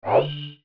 Sword Wave; Master Sword

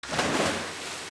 Stinger Appear; OOT

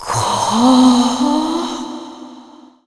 Great Fairy Blow; Great Fairy blowing magic onto Link

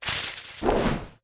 Grappling Hook Pull; Pull back the Grappling Hook (Recorded)

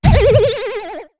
Big Fall; Deku Link landing from a big fall

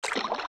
Steps Deep Water Land; Zelda; OOT

